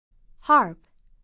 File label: harp